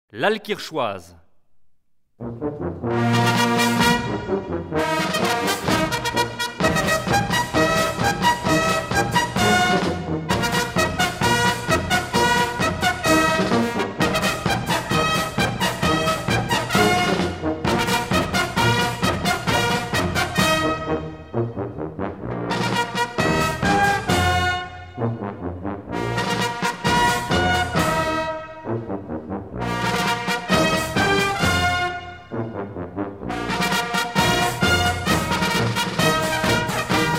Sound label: Fanfare; Alkirchoise